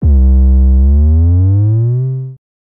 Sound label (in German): langer glide zeigen; 808; Der ultimative 808 Producing Guide